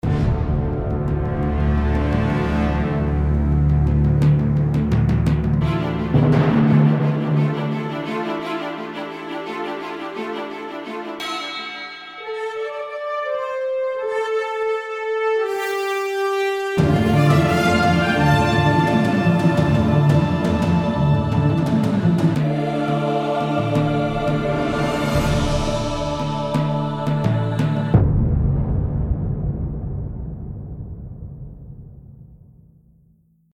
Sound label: Action Music